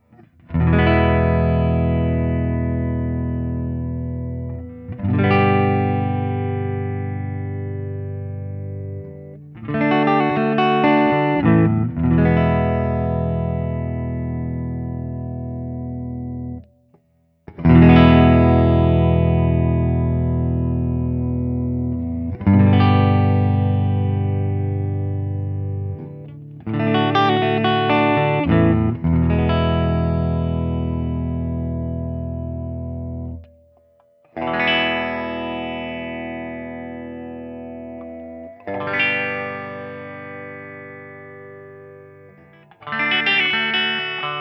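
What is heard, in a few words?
Guild S300AD ODS100 Open, guitar; sunburst Guild S300AD guitar; Open Chords #1; both pickups out of phase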